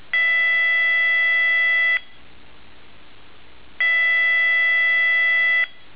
Tone, Intermittent 660 Hz at 0.28 Hz (1.8s On-1.8s Off)